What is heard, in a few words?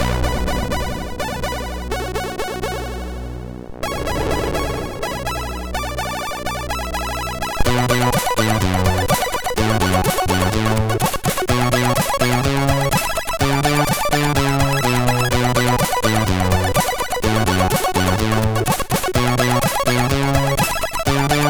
Mars Disco